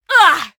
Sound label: Female Fight Damage; mighty party 2017 gamerip pc ios android